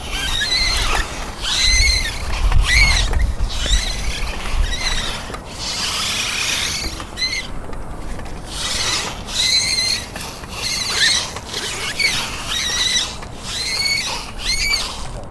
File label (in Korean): 바닥에끄는소리